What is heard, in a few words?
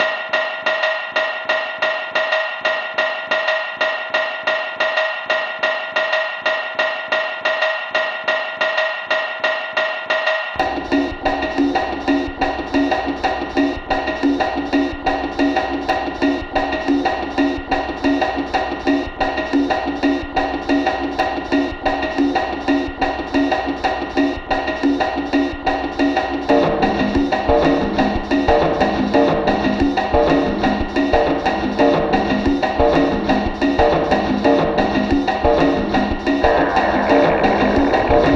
Boot Sequence; Jazzpunk